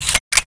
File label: blaster; quake2; coop